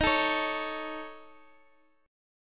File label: A Major Flat 5th, Eb